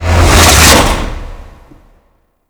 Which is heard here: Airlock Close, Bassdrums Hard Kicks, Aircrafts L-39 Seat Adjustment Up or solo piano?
Airlock Close